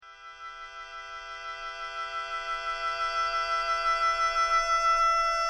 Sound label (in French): clarinette rev, synths